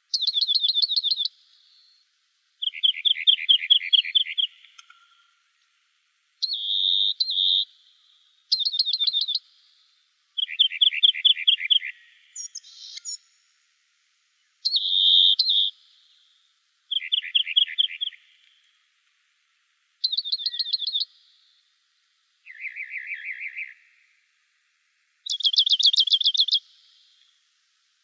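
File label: Rock Wren; ROWR; deviche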